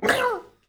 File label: piglette damage; Angry Birds